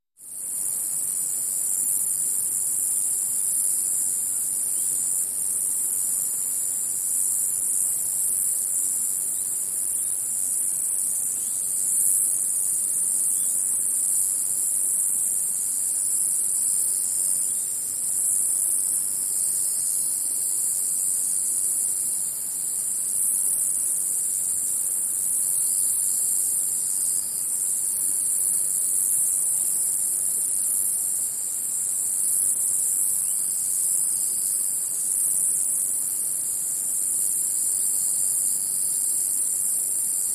Continuous Cicada Ratchet With Birds And Wind In The Background.